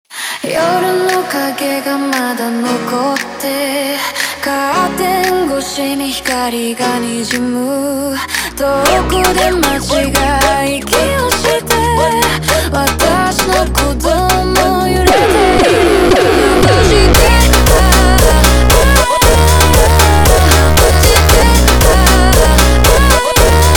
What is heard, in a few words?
Montagem Jumpstyle slowed